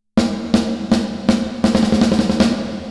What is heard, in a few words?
Chorus High Detune